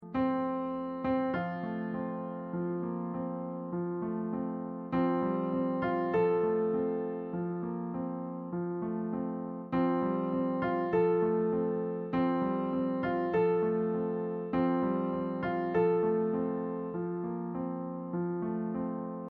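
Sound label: taps instrumental, folk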